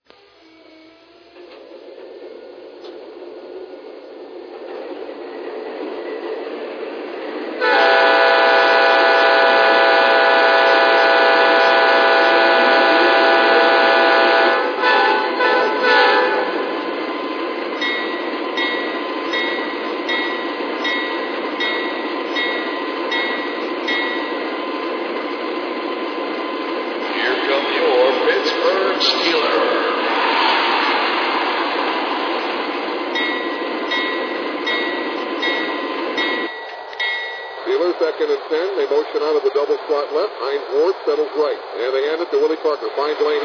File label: ES44AC, trains; STEELERS